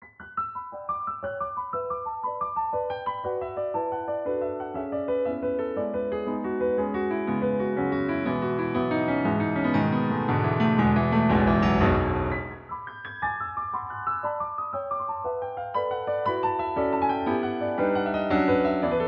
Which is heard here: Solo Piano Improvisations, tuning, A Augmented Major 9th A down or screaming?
Solo Piano Improvisations